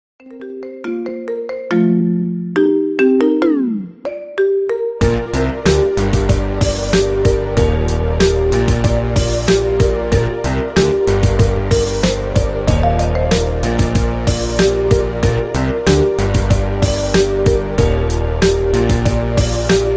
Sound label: Marimba Ringtone Remix